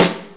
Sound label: Snare, Snare Drum; Drum Tools